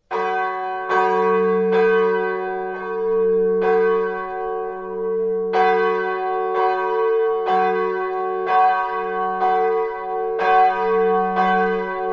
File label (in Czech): jenisovice souzvuk zvonu